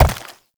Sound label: Step Flesh; Warframe; Uriel